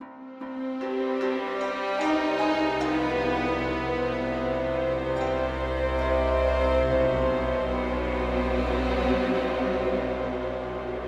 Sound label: Dungeon Ambient Crypts Loop; Starlight